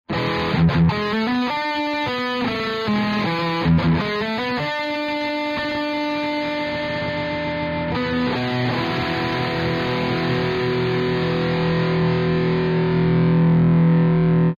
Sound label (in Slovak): napodobenina Mesa